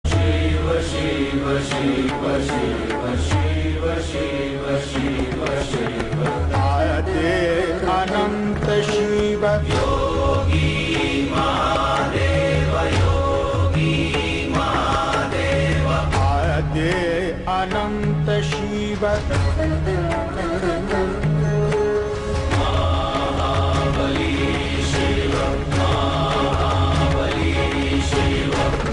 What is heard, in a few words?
shiv stuti ringtone shiv shiv